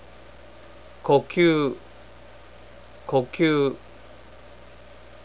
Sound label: breathe; japanese